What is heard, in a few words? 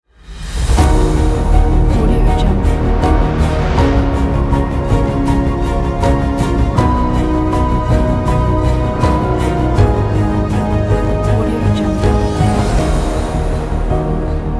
cinematic grand epic ident